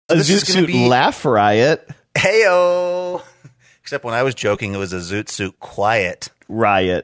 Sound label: laugh riot; a zoot suit